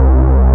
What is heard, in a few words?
JUNO STR; E-MU Formula 4000 Series Vol. 3 – Analog Odyssey; Vintage Keys